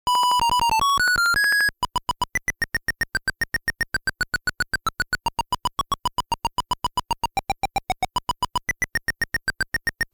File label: ~Bataille~ (Encounter ~ Battle ~ Victory)